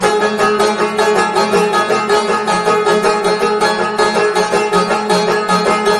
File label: banjo; music